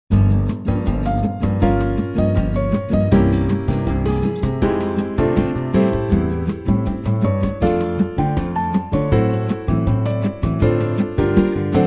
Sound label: sensual romance